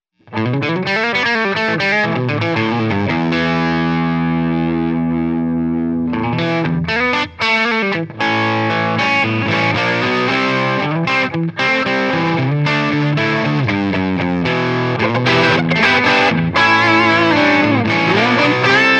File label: h55 30w p90